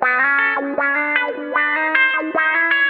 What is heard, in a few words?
GUITAR